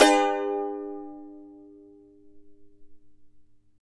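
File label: CHARANGO, A major, down; Spectrasonics; Hans Zimmer Guitars VOL-2; CHARANGO CHD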